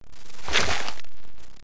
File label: zombie crawl right arm